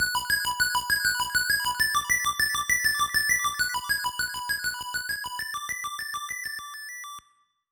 Scene Clear; Teenage Mutant Ninja Turtles II The Arcade Game

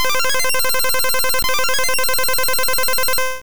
Sky Attack Hit

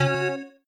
Starting Sound; multiplayer kart racing; boom karts; android

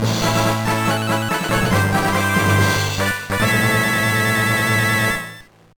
Level Clear; Magical Quest Starring Mickey and Minnie; GBA

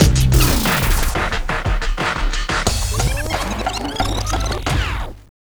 Music Lose

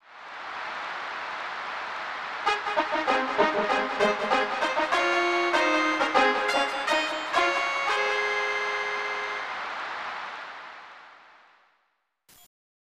Fanfare; thoroughbred breeder 2; 1994 snes

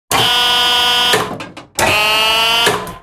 Idle Tower

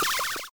Gas

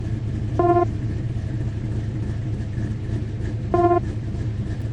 Rad Mech Ambient

